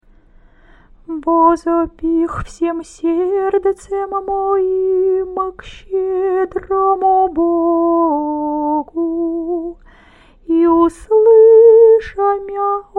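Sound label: canon hirmos, singing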